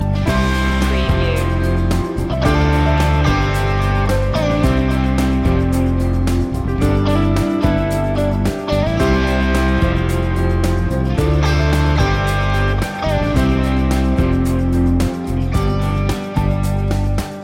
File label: easy drive loop